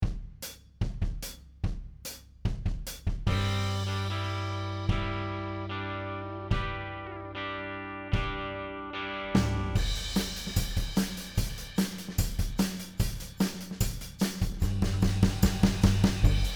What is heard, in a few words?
Guitar backing track